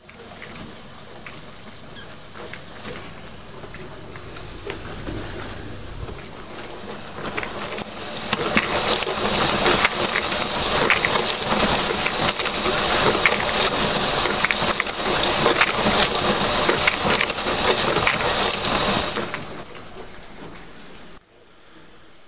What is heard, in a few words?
dishwasher